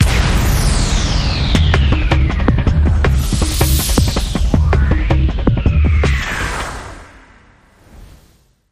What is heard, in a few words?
Shuffle Beat Sweep